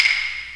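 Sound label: CLACK, JAPAN DRUM; Best Service Advanced Media Trax Modern Composer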